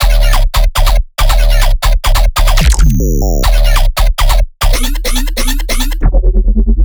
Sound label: bass loop 140 D; Virtual Riot